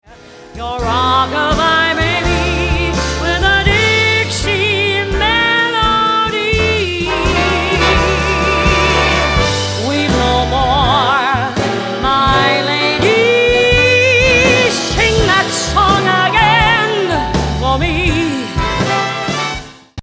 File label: rockabye